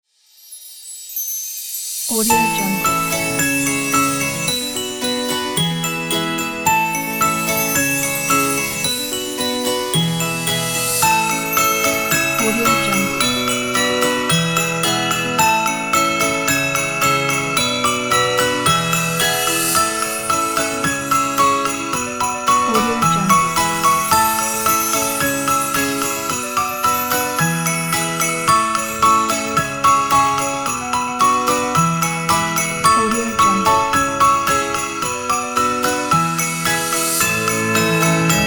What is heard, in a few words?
christmas bells